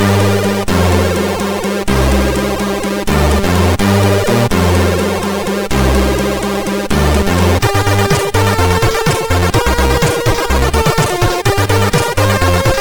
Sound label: Vibration, MOS6581R4; sid